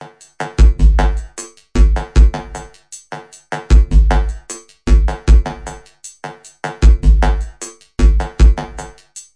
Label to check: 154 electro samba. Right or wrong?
Right